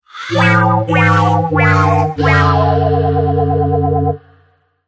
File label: game lost